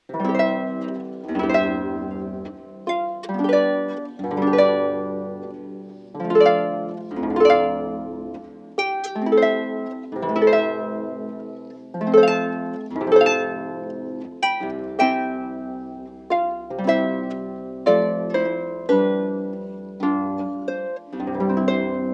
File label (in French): harpe; cs2000